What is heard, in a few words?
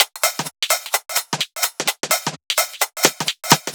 Beat, 128bpm, uk garage